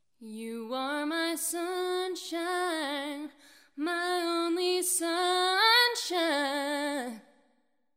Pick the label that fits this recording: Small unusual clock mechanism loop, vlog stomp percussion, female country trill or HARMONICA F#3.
female country trill